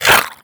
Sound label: grunt, headcrab, creatures; headcrab classic; vox